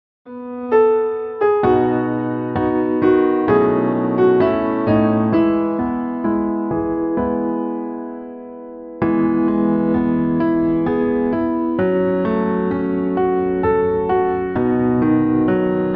BACKGROUND MUSIC

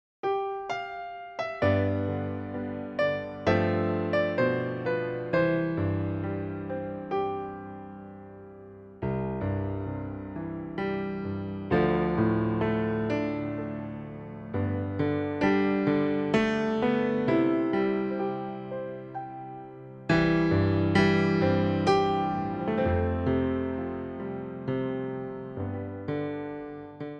BACKGROUND MUSIC

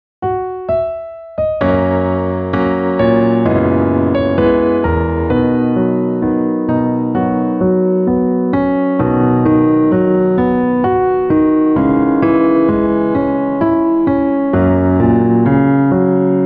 BACKGROUND MUSIC

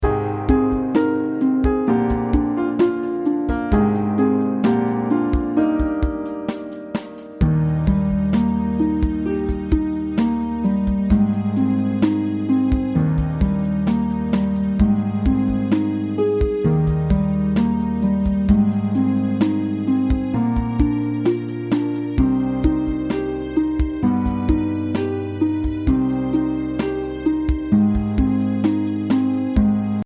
background

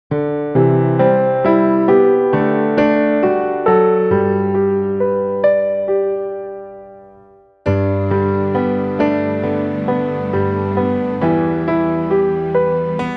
Instrumental; Male

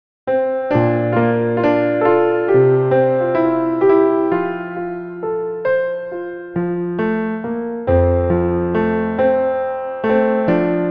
piano with melody line

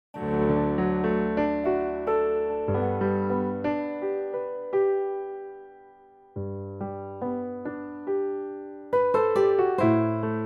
WITH VOCAL HARMONIES BACKGROUND MUSIC

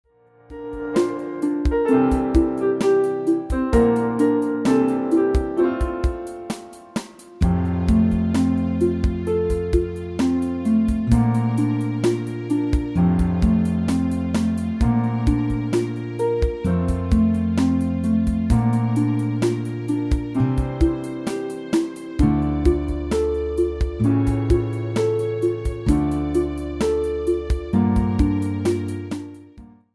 Background Music

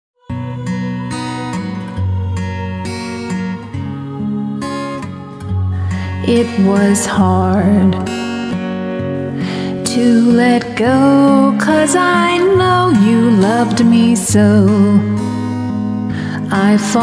Female Vocal

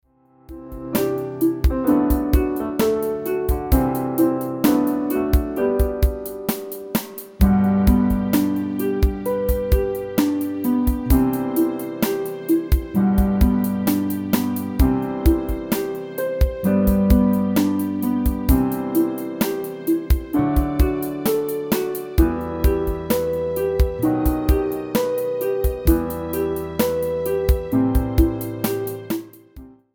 Background Music

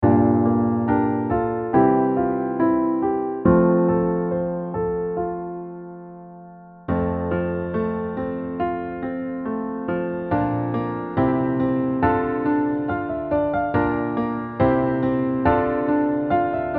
Instrumental

